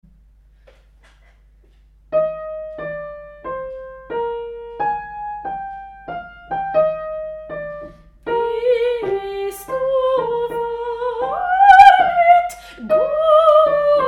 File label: sopran